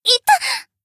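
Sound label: attack; asuna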